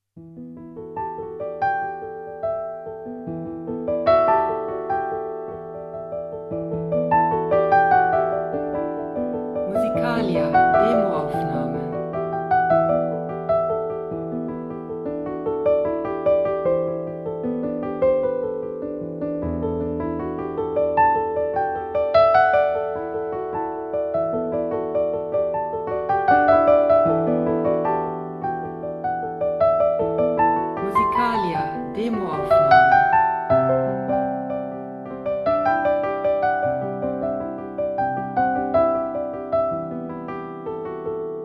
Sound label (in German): klavierimpro